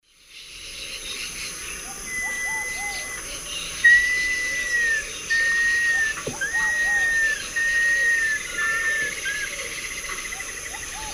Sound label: Nasica longirostris, song and call; S. America; Eastern Lowlands